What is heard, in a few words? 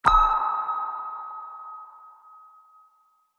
torpedo; World Of Warships; weapons